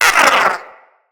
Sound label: Wyrm Idle, Pets, Vocals